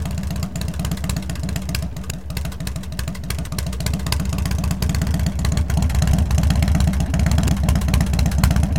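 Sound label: weakly working broken small motor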